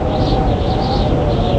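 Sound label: wind alpine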